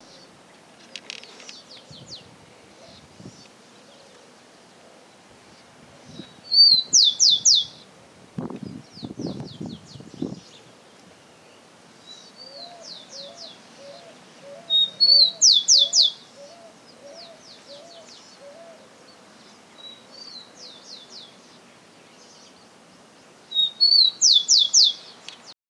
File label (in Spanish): chingolo voz; aves